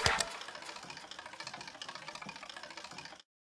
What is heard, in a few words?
reel Spin; aloha